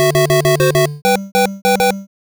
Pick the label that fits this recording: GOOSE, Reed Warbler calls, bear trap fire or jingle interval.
jingle interval